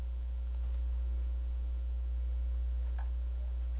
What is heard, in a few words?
noise